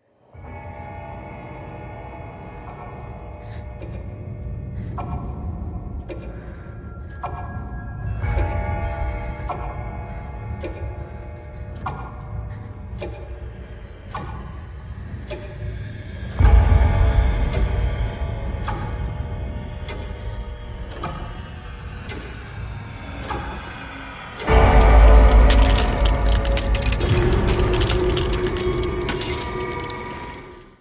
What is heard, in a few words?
Grandfather Clock; Vecna